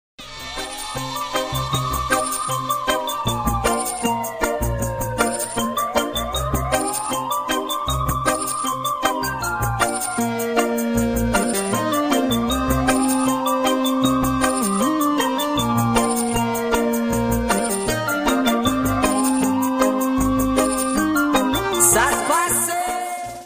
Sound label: Instrumental